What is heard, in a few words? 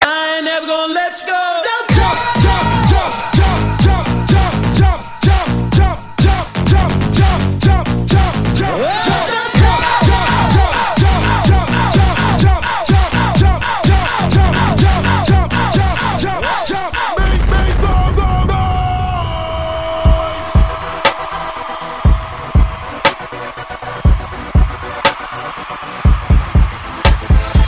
Rap Mix